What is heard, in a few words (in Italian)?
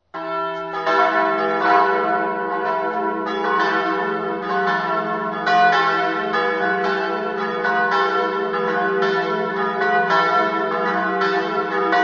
paluce, campane